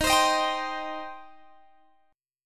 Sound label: Eb 6th Eb down Guitar Open G